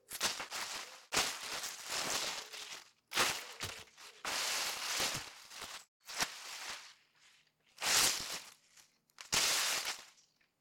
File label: Bush Weed Scrape Pile Rustle Dump